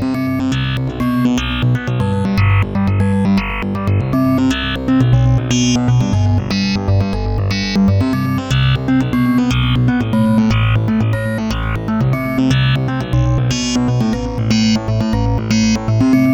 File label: upbeat future; SciFi Warehouse Kit